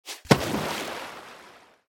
Jump; Troll Face Quest Unlucky; Android

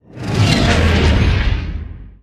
Space world Pass by double truck; Talking Tom Gold Run

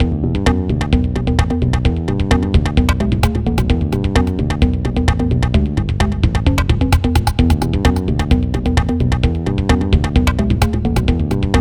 Forest 130bpm; super stone legacy

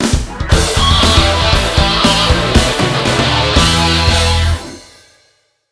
Catch Big; big catch bass fishing 2; wii

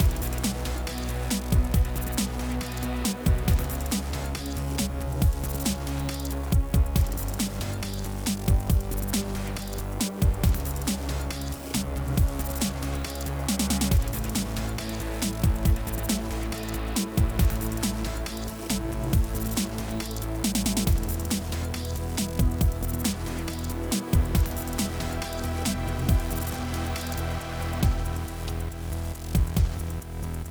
Combat, 138BPM

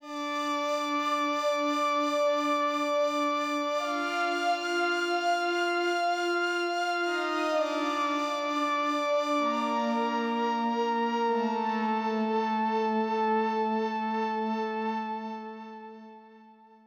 Pads

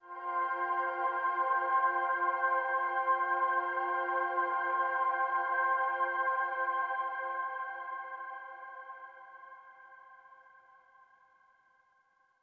Overlay Drone

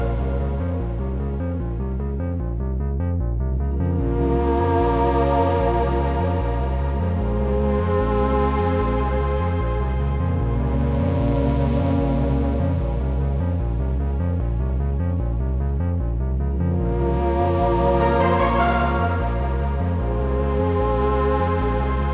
Loop No drums 150bpm; jenny leclue detectiv

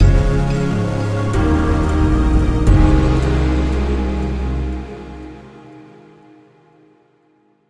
Shimmer Loss

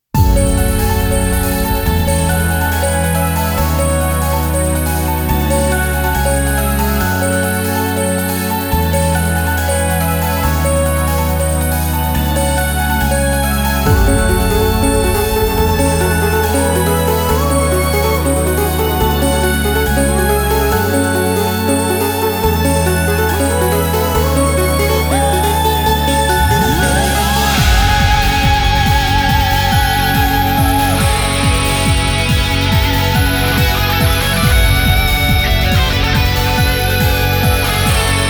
Battle Dawn loop; Star Gods

